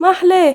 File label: GIRL, GIRLVOICE, Arabian Traditions; USB Soundscan vol.43; AKAI